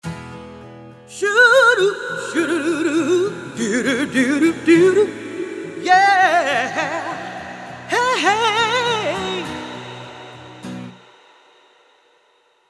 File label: BOSS VE1 ENH Green Echo Large